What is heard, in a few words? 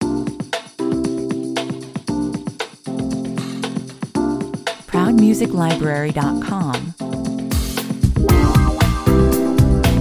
jingle style 116 bpm